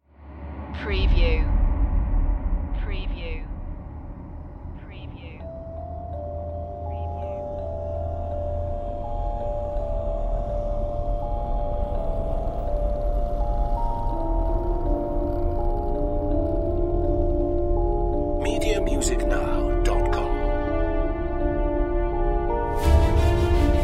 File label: dark rituals